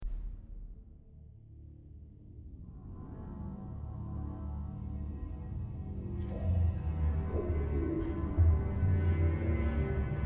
ambience deep sea underwater dream fever dream unsettling